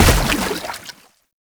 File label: Step Water, Footsteps